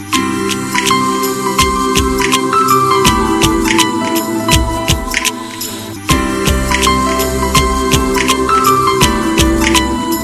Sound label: soft music